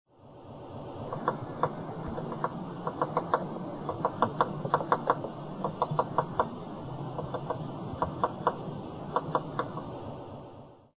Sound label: Picoides scalaris